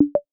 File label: joined call